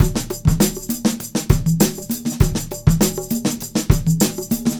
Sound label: Combo, R&B Drums